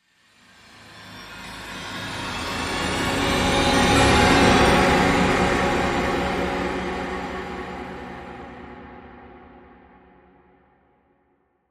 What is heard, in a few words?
Heavenly Sweep